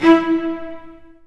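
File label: Cello Spiccato E1; Digital Sound Factory Virtuoso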